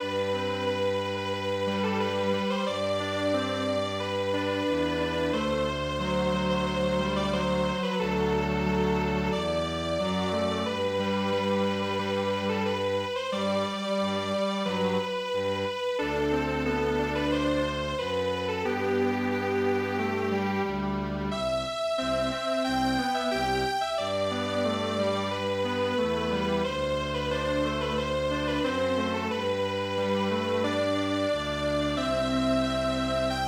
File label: Strings Quartet